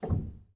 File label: rel, Grand Piano; bitKlavier Grand; Piano Bar